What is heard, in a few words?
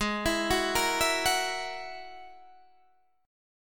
Ab 7th Suspended 2nd Sharp 5th, Guitar, Open D